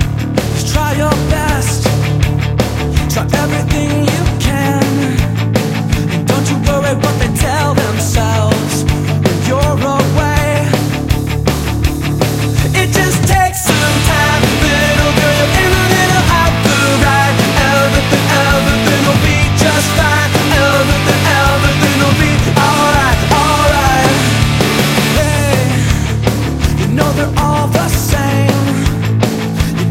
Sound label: Emo Pop